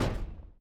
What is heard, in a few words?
shoot; Mindustry; Stell